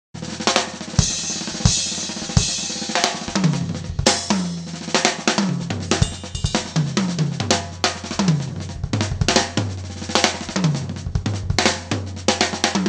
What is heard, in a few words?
drum kit